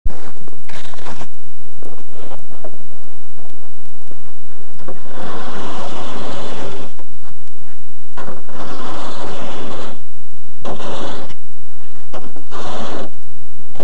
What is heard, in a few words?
instrumental; thrash avantgarde